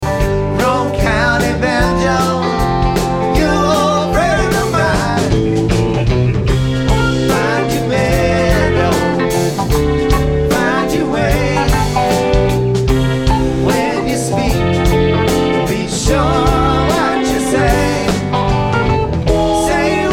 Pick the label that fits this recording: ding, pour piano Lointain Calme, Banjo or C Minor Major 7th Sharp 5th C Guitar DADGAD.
Banjo